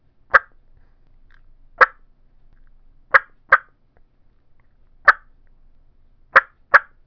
cuttin edge mouth call clucks, turkey; Southland Sounds

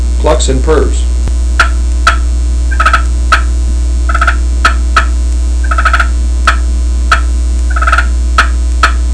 stainless clucks purrs, turkey; Southland

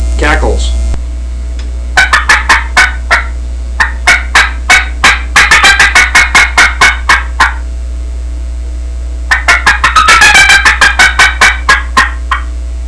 mt top cutter cackles, turkey; Southland Sounds

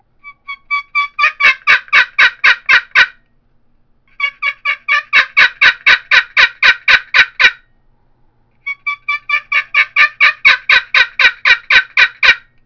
painted poplar maple boat paddle yelps, turkey; Southland Sounds

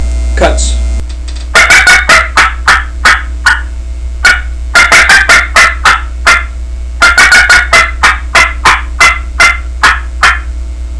favorite cutts, turkey; Southland Sounds

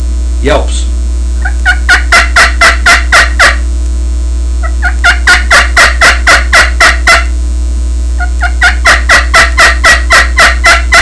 aluminum yelps, turkey; Southland game calls

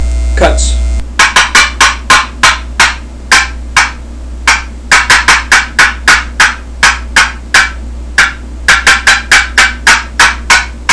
cutts, friction box; ww box; blk myst

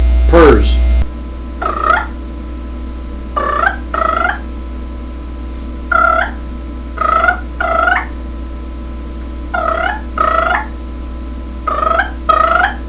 purrs

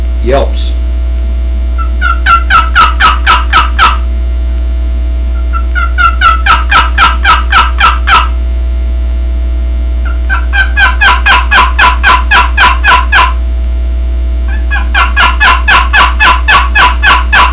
old timer yelps, turkey; Southland Sounds

